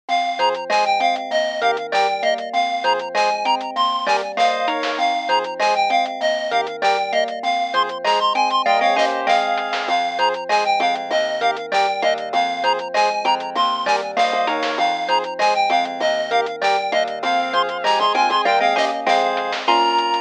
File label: Ringing -Village-; Steins Gate; psp